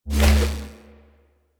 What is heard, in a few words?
Fishing Spear Charge; Warframe; Venus